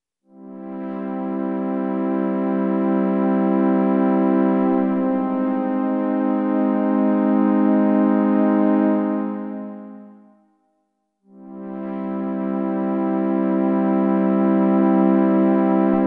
Retro AnaStrings, Oberheim OB-8

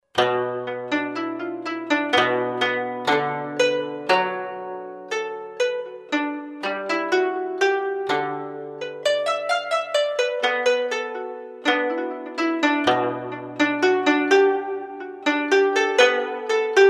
Gu Zheng Pik; Roland SR-JV80-14 World Collection Asia

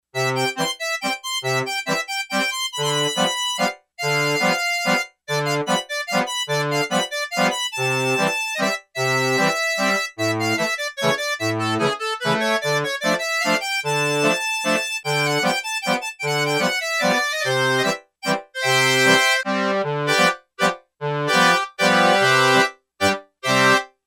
Accordion

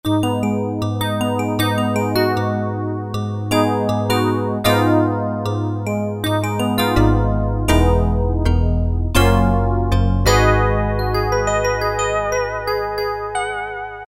Metallic E. Piano, Roland MKS-70

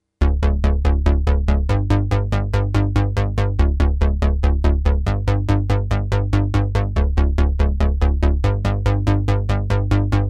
Ambience

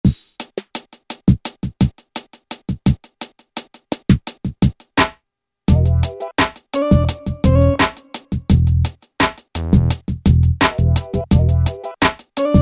Sultry, Yamaha DJX Styles

